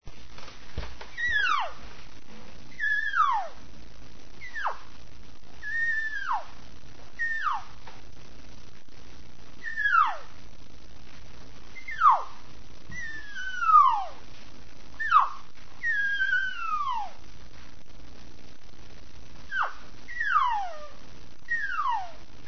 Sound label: cow, elk; elk contest; elk 2001